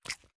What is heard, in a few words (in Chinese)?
青蛙进食声